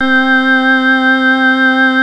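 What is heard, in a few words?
C3 rotary organ; Drums; Proteus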